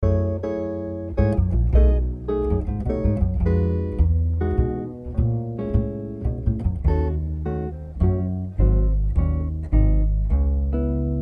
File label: Smooth Dinner Jazz Soundscape; Social Distancing; Gratomic